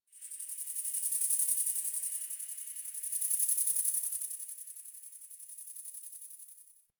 Insect Day; Ambience; One Offs; Warframe; Eidolon Plains; Eidolon Landscape

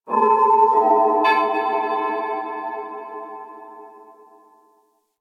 Moon Chimes Medium C, Ambience; Warframe; Orokin

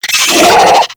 Infested Charger Alert, Enemies; Warframe

